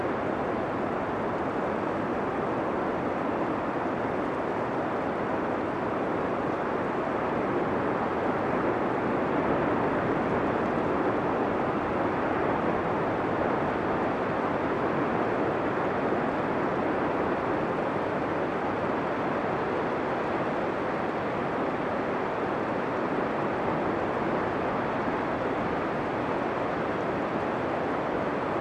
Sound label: White Noise